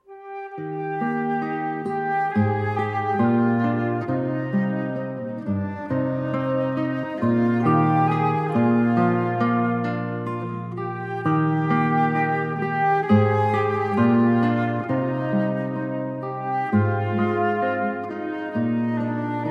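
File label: Accompaniment; Laudate omnes gentes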